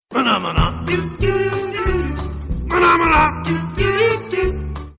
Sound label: Theme Samsung Ringtone; Muppets